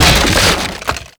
Wood Bench Destroy; Ambience; Props; Warframe; Nineteen Ninety Nine

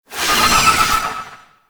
Shield Drone Projectile; Enemies; Abilities; Warframe; Tau; Dax Medic

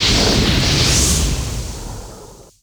Fire Engulf, Exterior; Warframe; Awakening Remaster

